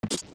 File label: Steps Wood Jump; Jumping and landing on Wood (young); OOT